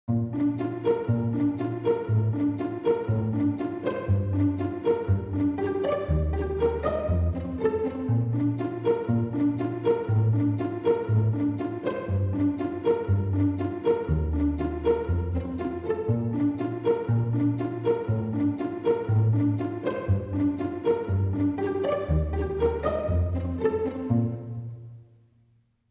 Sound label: Strings Only